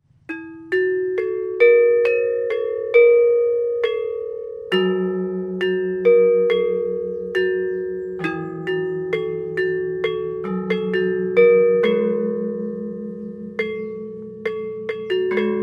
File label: Gamelan Slunding